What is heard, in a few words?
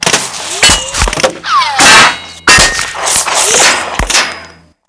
Hedge Impacts